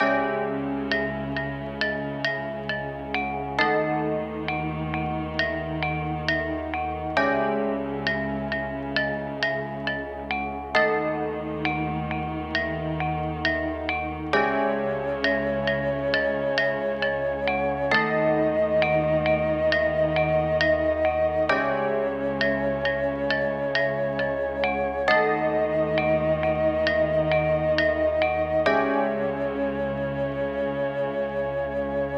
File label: 134BPM, C# Minor; taste of diamonds